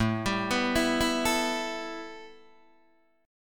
A 7th Suspended 2nd A Guitar Open D, chord

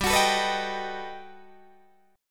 G Augmented Major 9th, Guitar, Open D

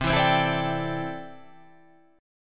C 6th Add 9th C down

